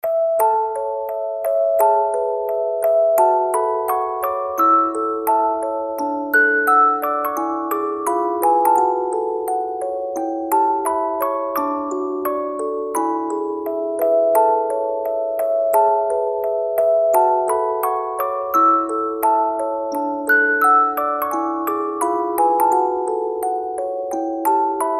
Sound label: Marching Band, Music Box; MitchiriNeko